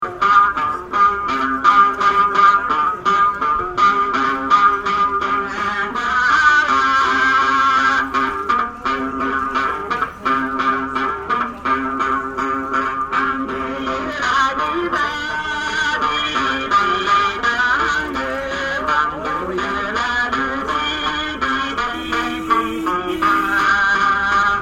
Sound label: Lhasa Blues; tibet